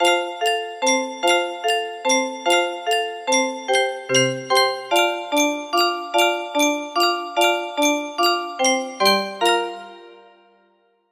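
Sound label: processing chords, music box melody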